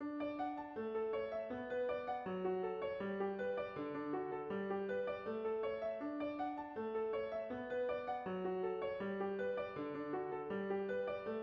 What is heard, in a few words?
Canon in D Easy Piano; songs for kids